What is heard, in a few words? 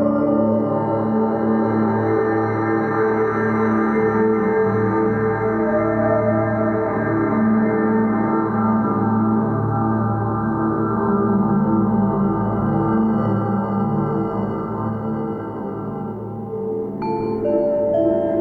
Moon Room Music Loop